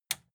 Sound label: metal button tiny push enable